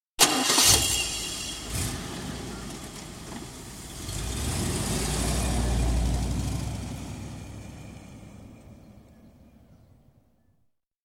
Rolls Royce Silver Spirit, exterior, start up & drive off (675 litres, 1986 model); Cars; BBC